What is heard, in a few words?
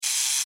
Scifi Servo G Mechanical Robot Small Motor Toy; BBFX